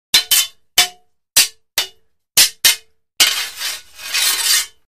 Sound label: Swords Metal Clang; Swords Metal Clang H; clangs